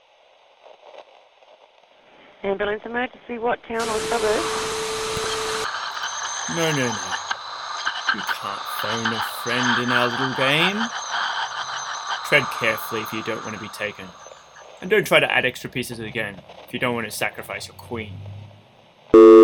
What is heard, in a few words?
Phone interupt